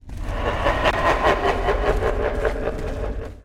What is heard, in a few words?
lich cackle; Various Evil Sounds